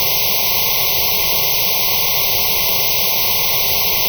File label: psr noise flat holes; linearaudio